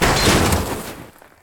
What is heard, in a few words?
death